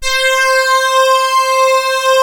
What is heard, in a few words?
poly sweep C4, Polymoog; Greytsounds VOL-1